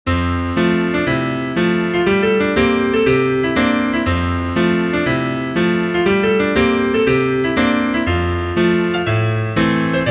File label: piano